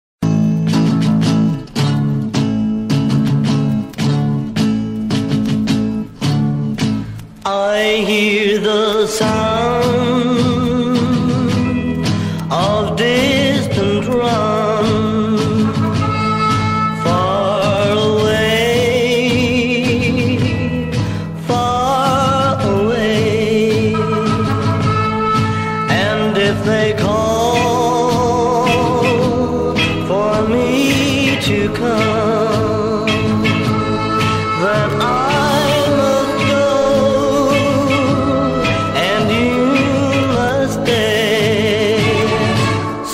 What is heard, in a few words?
distant drums; pacific